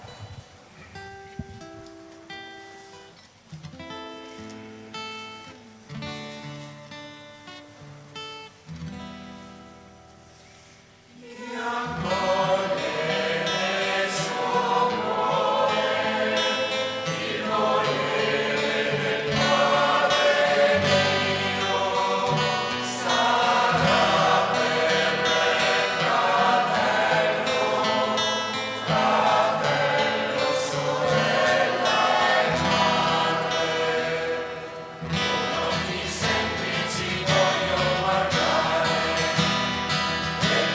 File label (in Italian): coro; Il Mistero; santa Elisabetta della Trinità